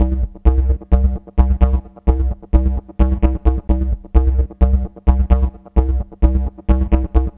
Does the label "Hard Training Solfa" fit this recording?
No